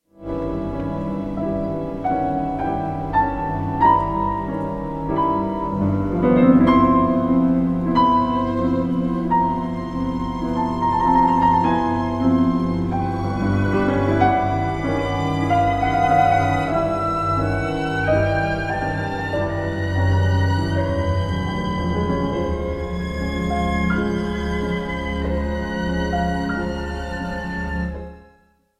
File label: piano concerto no 3 in C major; sergei sergeyevich prokofiev; op 26